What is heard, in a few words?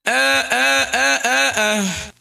"ah ah ahah uh"; Voice; ooh ahh